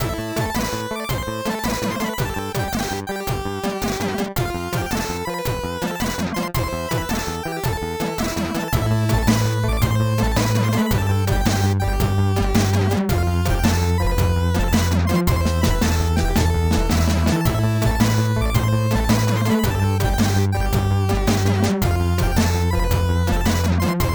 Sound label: robotic